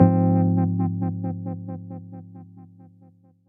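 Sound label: Fm chord; Flaivorz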